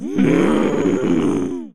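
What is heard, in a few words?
female zombie; Project Zomboid v41.73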